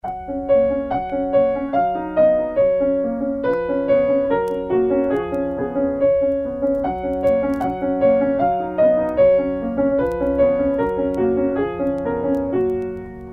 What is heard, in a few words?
swiss music box, Pianist; Level 4; Bastien